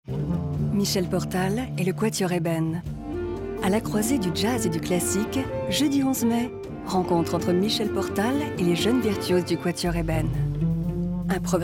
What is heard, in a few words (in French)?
concert portal quatuor; RF; SPOT RADIO